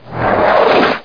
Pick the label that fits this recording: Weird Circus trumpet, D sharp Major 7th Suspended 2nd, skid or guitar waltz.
skid